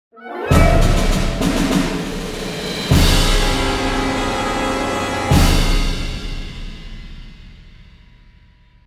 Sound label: Battle Stinger, long